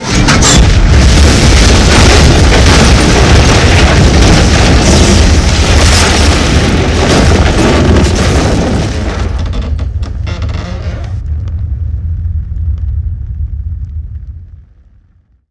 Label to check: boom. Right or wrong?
Right